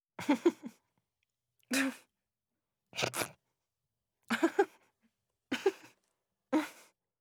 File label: Female suppressed giggly laughter; Laughs; Sound Ex Machina; GDC Game Audio Bundle